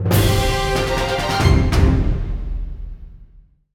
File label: Enemy Battle Clear Fanfare; The Legend of Zelda: Tri Force Heroes